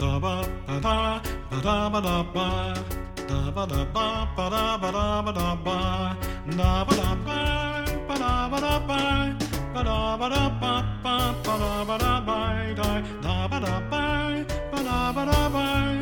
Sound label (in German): Tuesday Kanon vokal